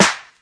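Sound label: classic hip hop snare; Classic Hip Hop Mega Kit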